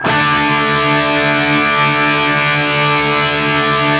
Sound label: 5TH D looped, Guitar; East West; Steve Stevens Guitar Samples Collection